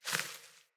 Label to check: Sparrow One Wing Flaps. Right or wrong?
Right